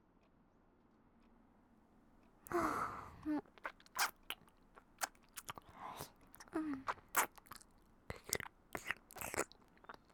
右 おみみをちゅっちゅ, ASMR

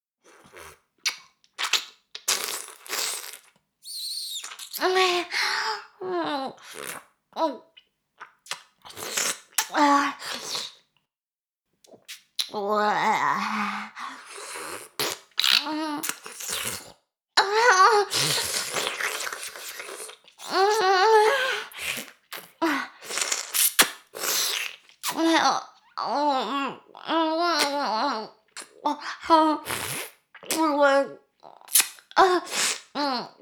耳舐め音声, ASMR; 牧場戦腺; 少尉

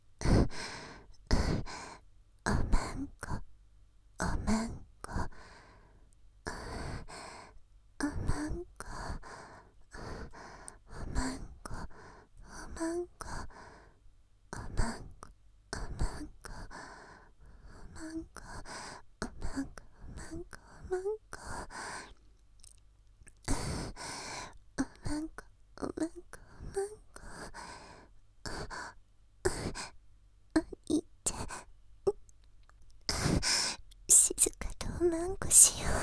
喘ぎ; お屋敷メイドのハーレム淫語ヘヴン 気が済むまで耳舐め淫語を堪能できるバイノーラルオナサポ音声; 静華; 右差分

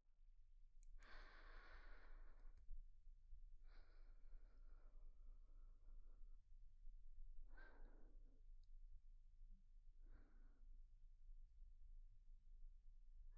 寝息右

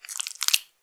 膣外射精

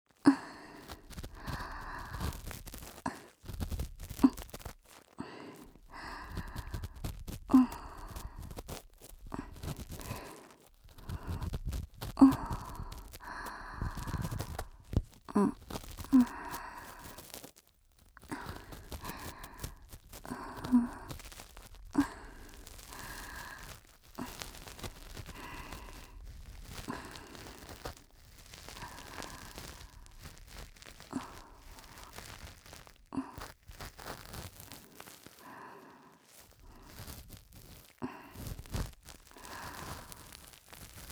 耳かき 右; えろごろ！

